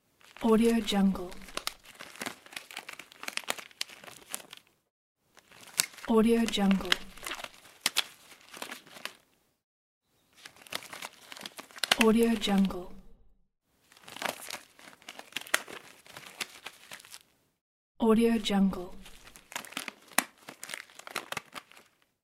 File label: putting on rubber gloves; vintervarg